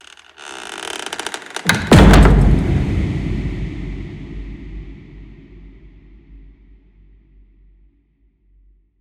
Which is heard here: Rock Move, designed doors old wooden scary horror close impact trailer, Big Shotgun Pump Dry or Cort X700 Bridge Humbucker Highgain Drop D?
designed doors old wooden scary horror close impact trailer